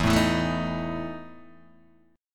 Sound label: E Major 7th Suspended 4th, Guitar, Open D